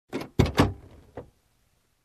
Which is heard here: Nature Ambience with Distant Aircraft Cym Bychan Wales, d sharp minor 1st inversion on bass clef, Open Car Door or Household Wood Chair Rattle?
Open Car Door